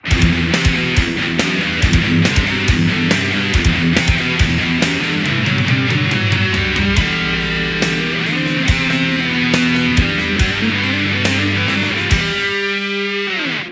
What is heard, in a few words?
Ball Boss Finished Music; Where Is My Brain